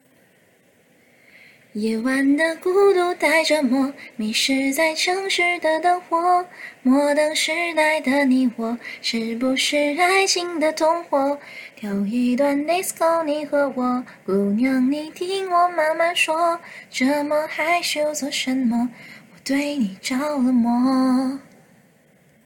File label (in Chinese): 清唱部分